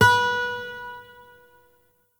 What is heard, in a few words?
Shawzin Oct Two B; Warframe; Lotus Shawzin